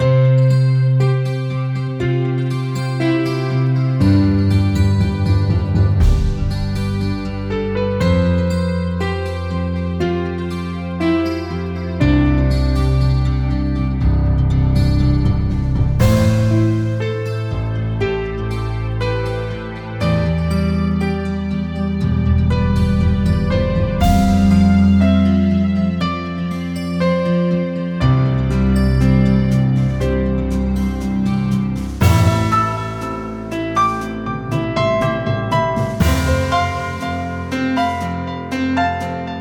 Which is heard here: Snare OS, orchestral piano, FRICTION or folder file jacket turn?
orchestral piano